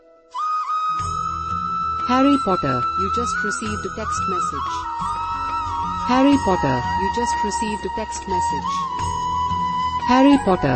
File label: krishna devotional flute ringtone; harry potter